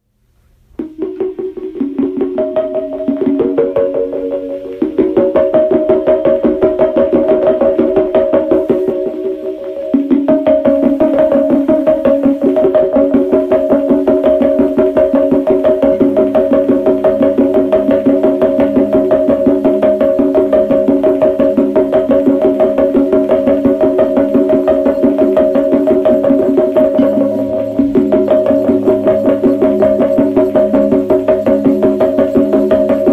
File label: (Synthesizer, Two Idiophones, Rin Gong)